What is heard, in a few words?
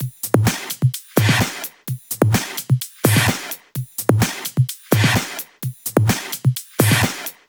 128BPM Kit; Vengeance Samples; Fatman Kit